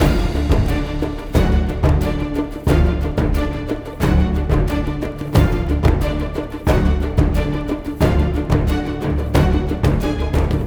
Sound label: Library Percussive